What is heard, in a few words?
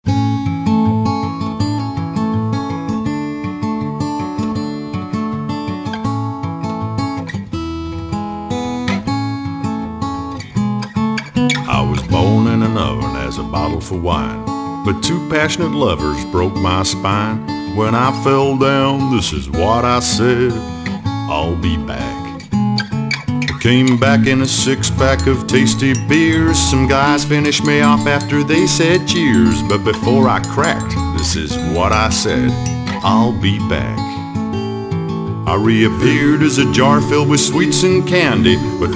singing commercial; Feve Friends Of Glass